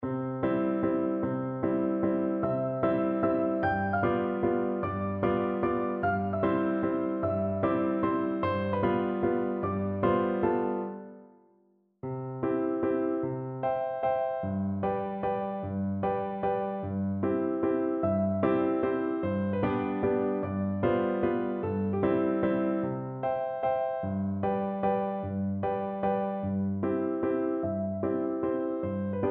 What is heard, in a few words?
la donna, Flute; verdi